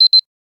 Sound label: Timer Beep; Huawei Y6; alarms